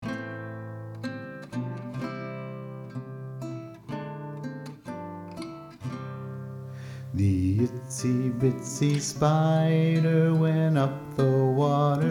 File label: Learning